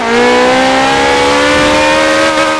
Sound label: third, vehicles; ferrari 458 risi